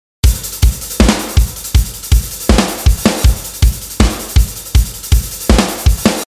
160 POP PUNK LOOP SIXTEENTH HAT BLASTER AND SNARES